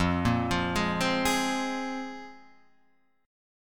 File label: A sharp 7th Suspended 2nd F, guitar